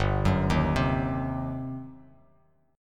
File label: G Major 7th Suspended 4th Sharp 5th, G, down, Bass Standard; GM7sus4#5 arpeggiated